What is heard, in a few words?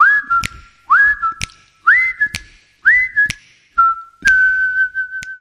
Whistle Swing, Sting